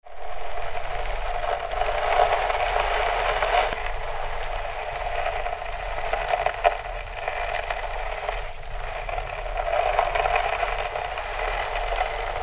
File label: rain worm movements; curiosoil